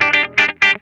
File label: HOT CHOP, Funk Guitar; Zero G - Funk Guitar